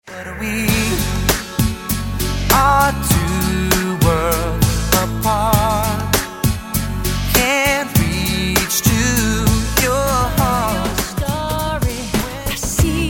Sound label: snare